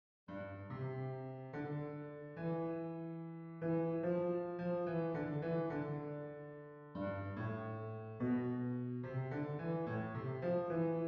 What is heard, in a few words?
Tenor 2, maennerchor